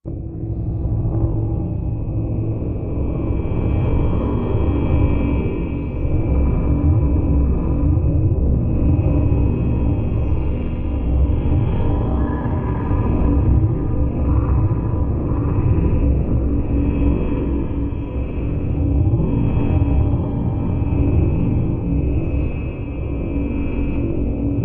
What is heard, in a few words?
Deep Space Machine, sci-fi ambience